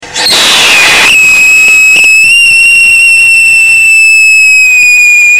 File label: titanium dioxide sodium benzoate, rockets whistle tests; Core Burner; Speed similar to no catalyst